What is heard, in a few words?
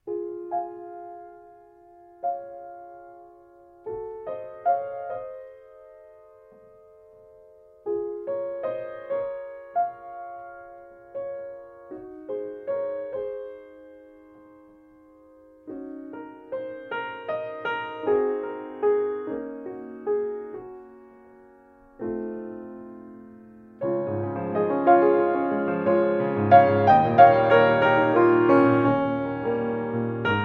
Piano RNR1